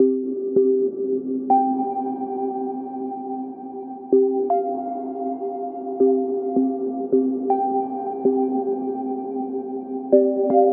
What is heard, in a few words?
Musical Loop 80 Synth Pluck C Major; Ghosthack